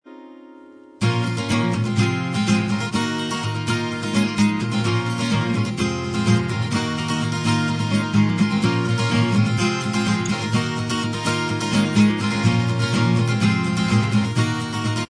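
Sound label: acoustic instrumental track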